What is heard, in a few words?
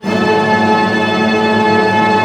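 tremolo D#, orchestra; Sound On Sound; Peter Siedleczek's Orchestra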